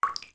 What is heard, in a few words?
Beneath Well Droplet; zelda; OOT